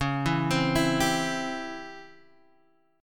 Db Minor Major 7th Flat 5th Db down Guitar Standard; DbmM7b5 arpeggiated; JGuitar